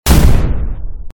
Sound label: explosion; infinite jumper